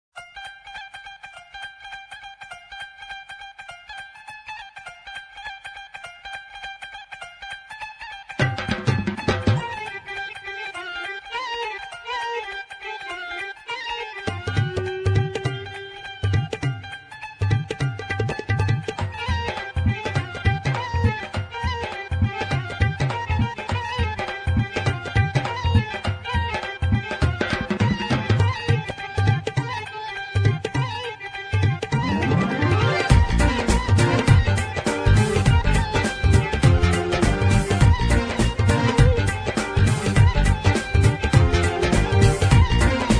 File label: BUNGABI; indish; pa80; korg